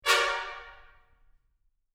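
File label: trumpet staccato cluster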